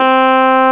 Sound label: clav C3; Flashback